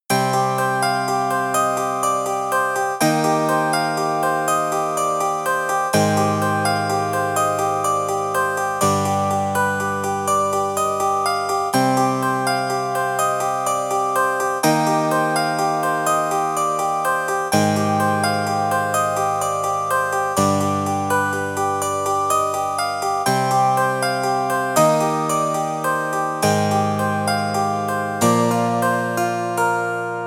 Pop Harpsi; VIVO S10

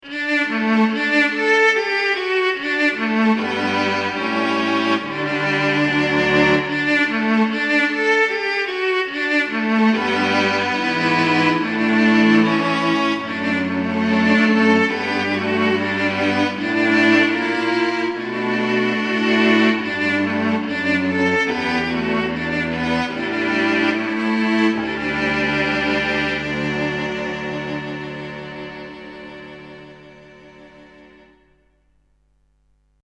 Cello Tape; VIVO S10

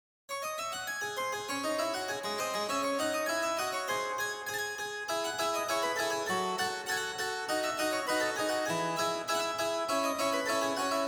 Harpsilute

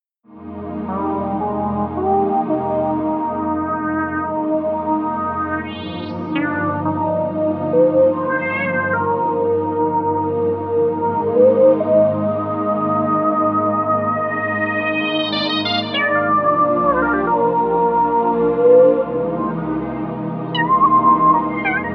Synth Lead; VIVO S10

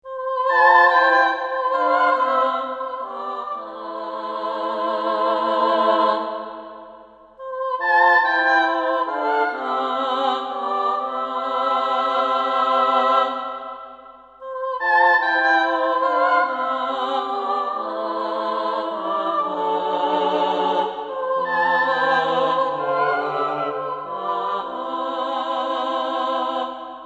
Female Choir Tape; VIVO S10

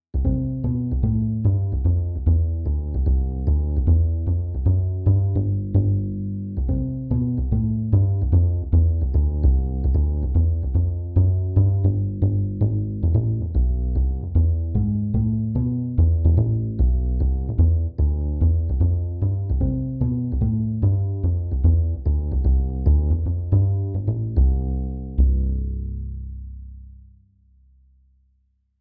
Sustain Double Bass; VIVO S10

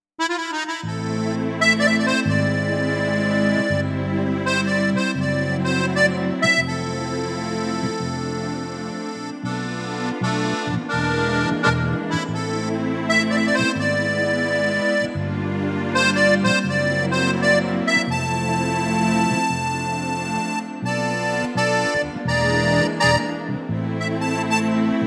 Musette; VIVO H10

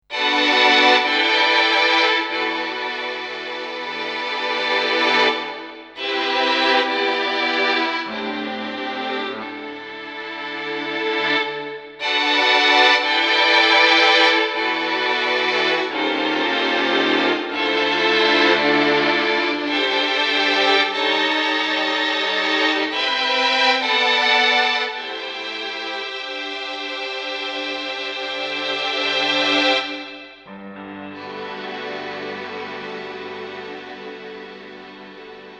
Violins Tape; VIVO S10

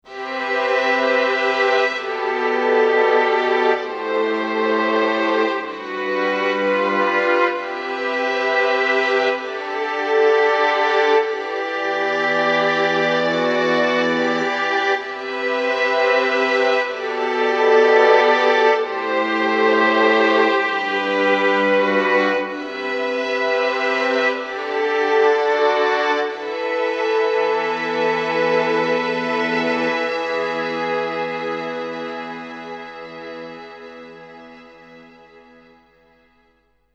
Strings Tape; VIVO S10

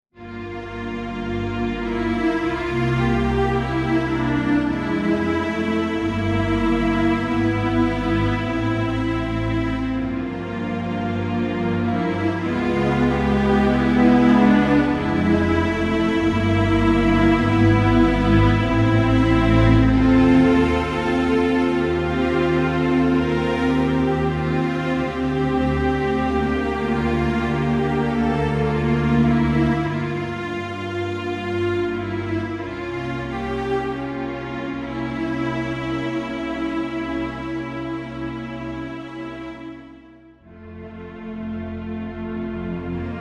Orchestral Strings; VIVO S10

